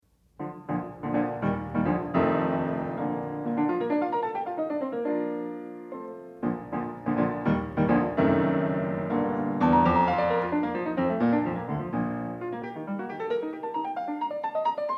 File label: Variations For Piano